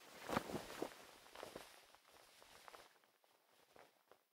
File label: Noren Cloth; The Inn